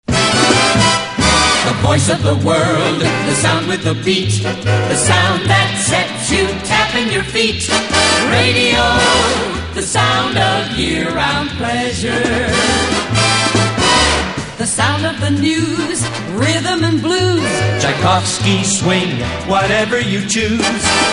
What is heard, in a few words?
jingle; nab 1965 year-round pleasure